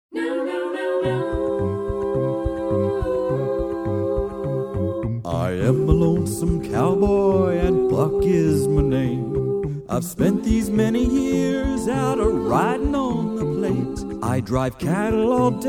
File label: Cowboy Chant